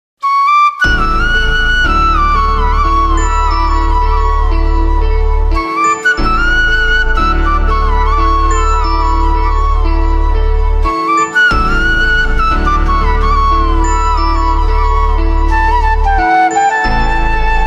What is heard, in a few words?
Flute Ringtone